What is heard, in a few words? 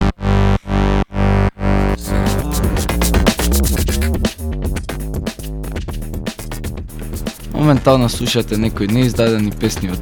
INSTRUMENTAL